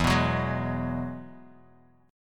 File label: E Major Add 9th E, Guitar, DADGAD; chord shape